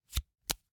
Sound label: Hwatu, card game, hard plastic, small, clatter, pull, slap, throw down, single card, soft; Eiravaein Works; Vaeyan IV